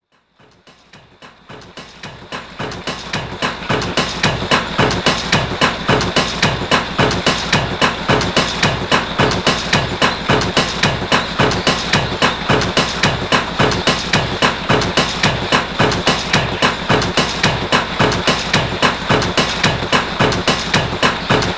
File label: Boxing - Roomtone; gamerip; Wii Sports Club